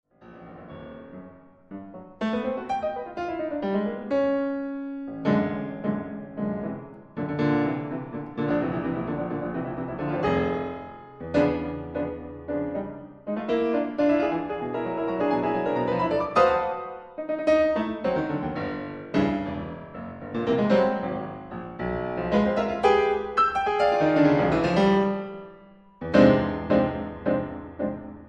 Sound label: Tango Impromptu; Antonio Bibalo